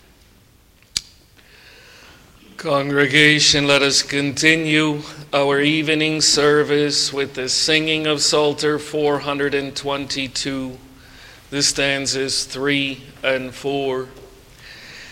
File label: Singing; The Strong Man Overcome; luke 11 21 22